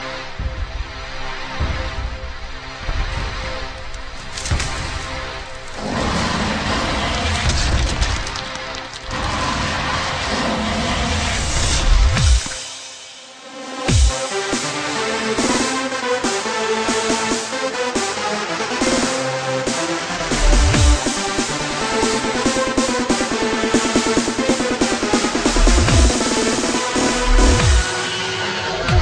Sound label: remix; Jurassic Park